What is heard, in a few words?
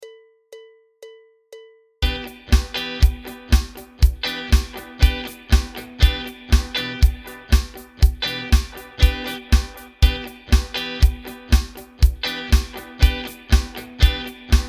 impro em7